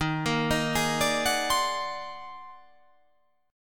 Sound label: D sharp Minor Major 13th D sharp